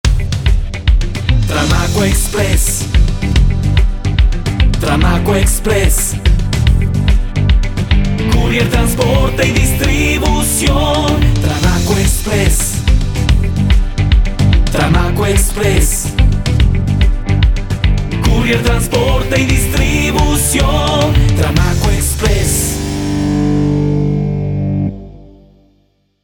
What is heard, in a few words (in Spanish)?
jingle sin locución; tramaco express